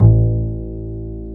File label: STR CB, Chamber Pizz; String Master Series